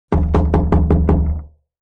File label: Open Hole; Forest